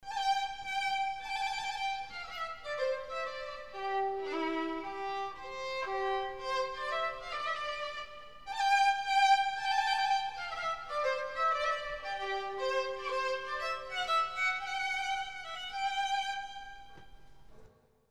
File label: Trumpet Tune